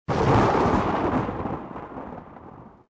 thunder; Soldier Of Fortune II Double Helix; XBOX; ambience

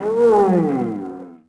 grunt, creatures; bantha; Star Wars Obi-Wan; XBOX

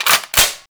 reload m79; weapons; Max Payne

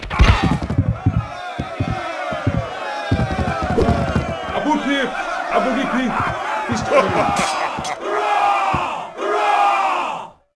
duel outro amb lose; Sid Meiers Pirates; XBOX

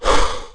Ranger Breath Out

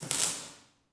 spark

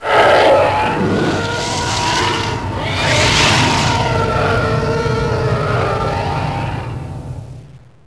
tie pass; tie fighter

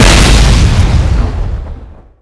explosion large

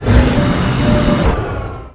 turbo; ships; Quantum Redshift; darius

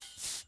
hand slide metal

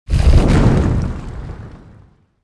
stone door retract; movers

